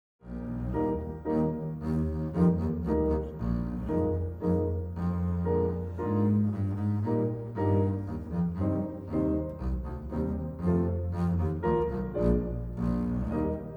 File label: Bass; Saint Saens The Elephant